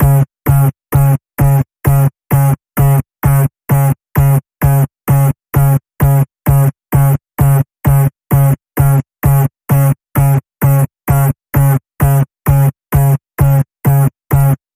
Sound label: Digital Rewind Pulse, C sharp